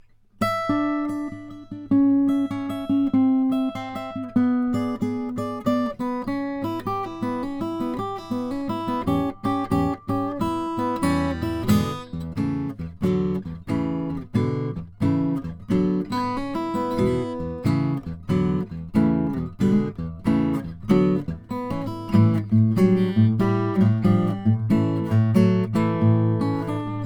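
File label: john walker petty creek elite full sunburst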